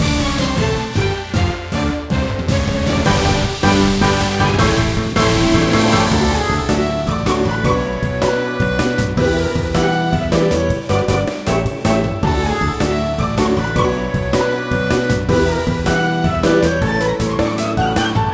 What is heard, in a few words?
Battle Loop